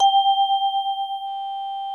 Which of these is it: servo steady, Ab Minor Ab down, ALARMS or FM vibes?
FM vibes